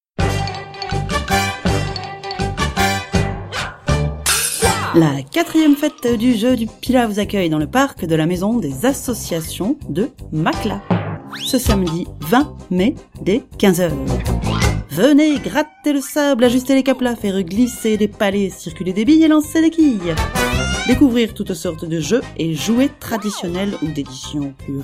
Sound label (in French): jingle